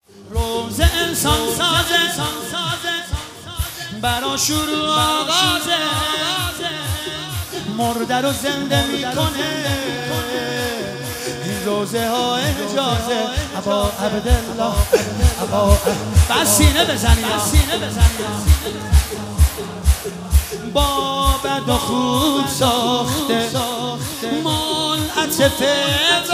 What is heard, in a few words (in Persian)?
مداحی; شور